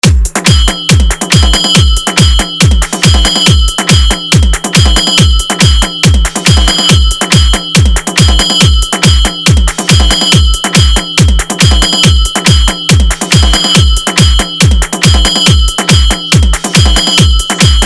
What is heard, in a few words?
Club & Police Horn Music; Total Compititon Mix